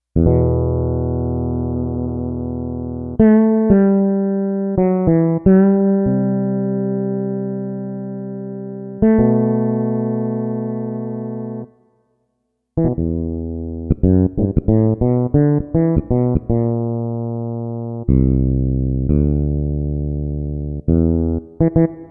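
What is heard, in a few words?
Bass Fretless Bs, synth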